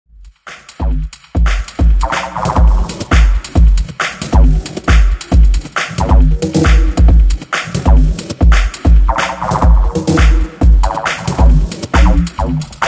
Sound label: watery drumming remix; Stroboscopic Artefacts